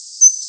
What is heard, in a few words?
song